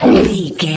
troll monster attack fast

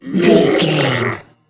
troll monster death croak

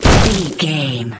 nature spell vines tree impact

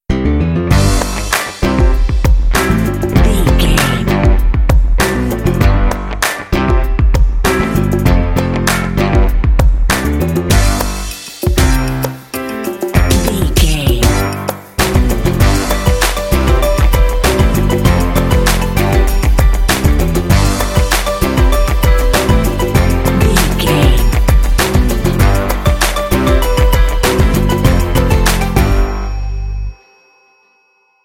cutie baby hip hop jingle

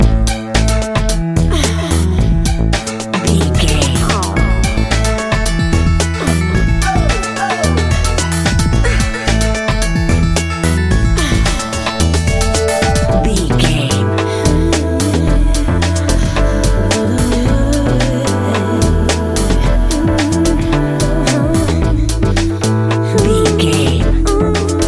light fluffy happiness

